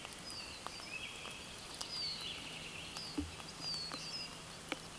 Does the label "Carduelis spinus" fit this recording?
Yes